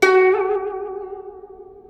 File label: vibrato